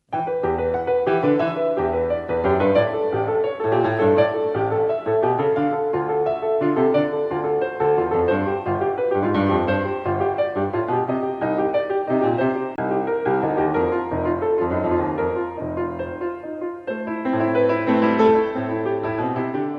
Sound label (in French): Prélude et choral